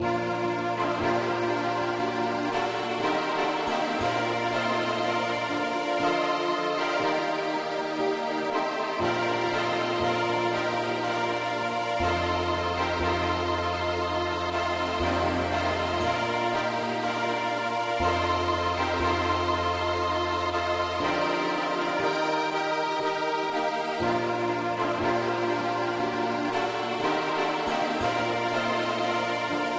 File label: pop No Voc Ac key bes; Jesus, Saviour, pilot me